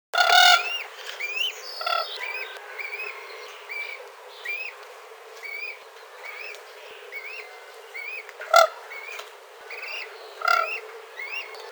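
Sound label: sterh golos, Siberian crane voice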